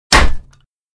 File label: Metal Baseball Bat Hit; Удар половником; Звуки удара; Звуки удара для Форсакен